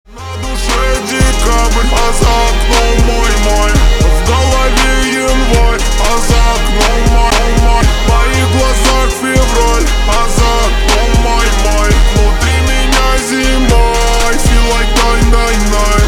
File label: screwed chopped